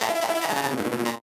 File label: Casio, Glitched, malfunctioning synth